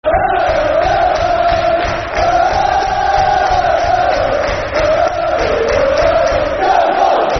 Der Der Yeovil, Football Chant; Yeovil Town Ytfc; fanchants

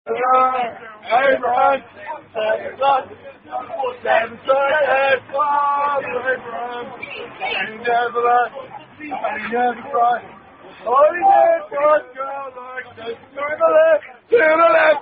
Father Abraham, Sung By New Zealand Rugby Players

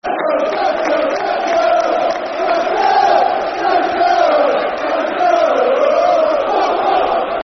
Time To Go Football Chants (Soccer Songs); Stoke City Scfc

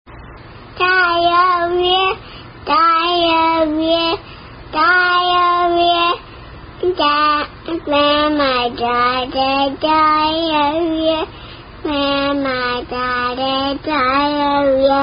Diarrhea Song Variant; Frequent And Watery Bowel Movements

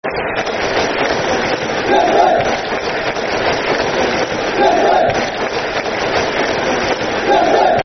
Clap Football Chant (Soccer Song); Grimsby Clap; Grimsby Town Gtfc

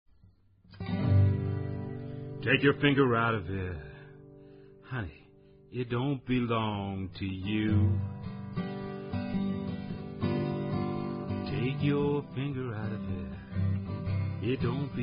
Happy Birthday To You, Semi-Bawdy Blues Song

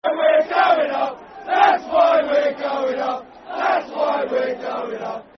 Football Chant (Soccer Song); That's Why We're Going Up; Milton Keynes Dons Mkdfc

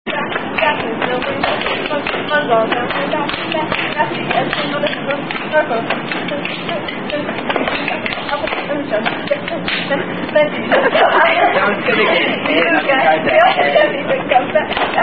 Miss Mary Mack, Smack, Smack; Compeditive

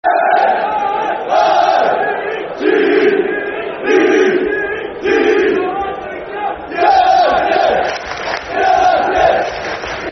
U N I T E D, Football Chant, Soccer Songs; Scunthorpe United Scfc

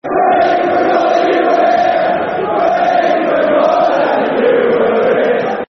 We Forgot That You Were Here, Football Chants; fanchants; Ipswich Town Itfc

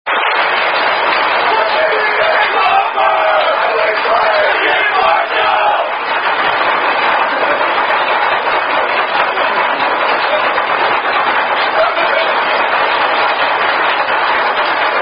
Signs Indian Drinking Game; Thumper

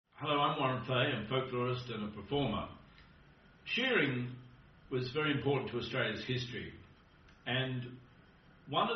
Shearing Songs

